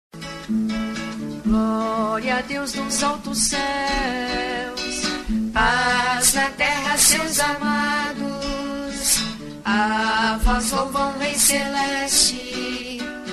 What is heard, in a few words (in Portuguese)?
Gloria a Deus nos altos ceus, cantos